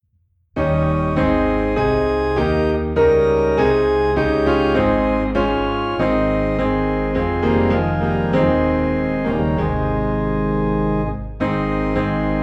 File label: Piano and Organ Low in A Flat; He Leadeth Me